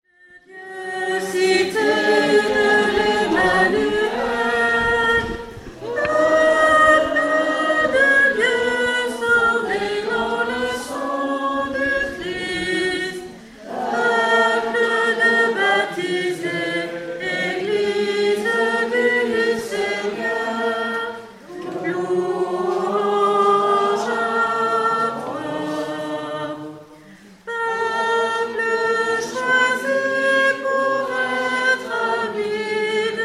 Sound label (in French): chant entree